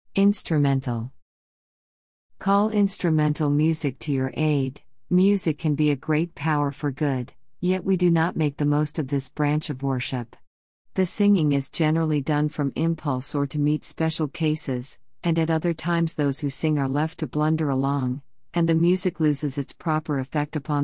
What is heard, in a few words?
instrumental